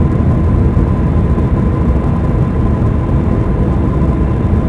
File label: turbine drone; machines mechanical